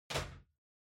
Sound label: Ceiling Light (Far RC Put)